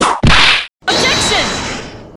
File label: whip objection; franziska aai; custom objections